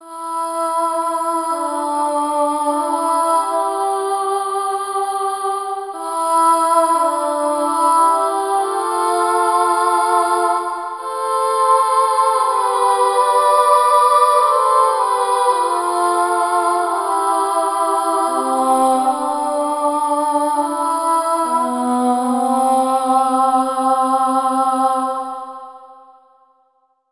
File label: Ahh; KORG OASYS; oasys assault